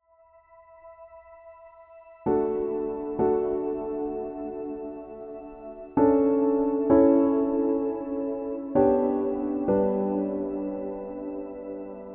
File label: Piano Theme